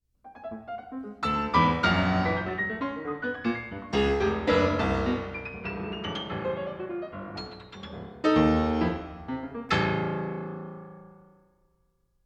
Piano Piece

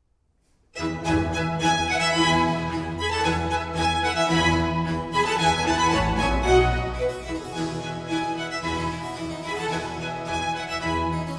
Violin Concerto in E Major, Allegro; RV 269, La Primavera

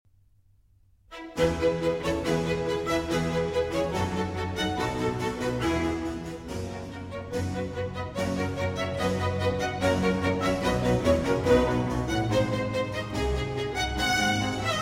Cello Concerto in F Major, Allegro; Vivaldi; RV412; 3. Allegro

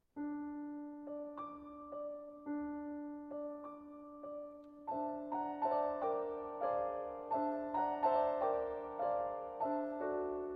Vocalise-étude en forme de Habanera, Oboe & Piano

